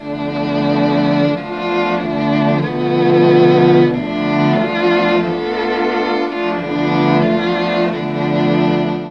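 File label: F#3 bass strings, Mellotron; BASSA+STRNGS; Mike Pinder - Mellotron Archive CD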